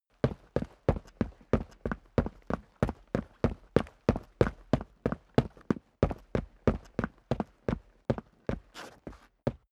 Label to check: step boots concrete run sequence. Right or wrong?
Right